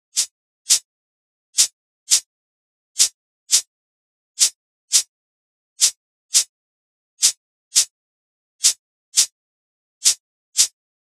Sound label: reggae shaker loop 85bpm